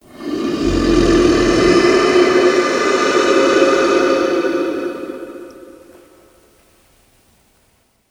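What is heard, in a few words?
dolgoe dihanie drakona, long dragon breathing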